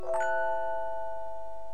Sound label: Country holiday default sound